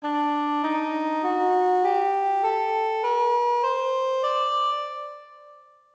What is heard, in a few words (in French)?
hijaz, La gamme hijaz